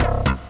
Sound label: mirage bass pair; OctaMED SoundStudio V1